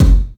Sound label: smooth kick drum single hit F# key